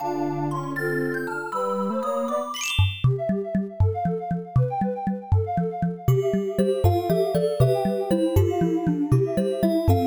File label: Underwater (Fast); new super mario brothers